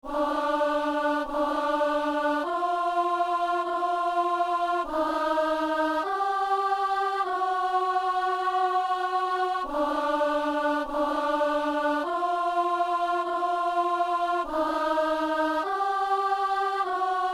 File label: alto; Doxologie du canon; messe de san lorenzo